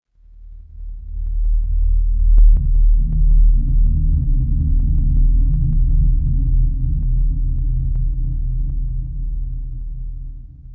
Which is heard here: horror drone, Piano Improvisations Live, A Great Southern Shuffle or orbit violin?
horror drone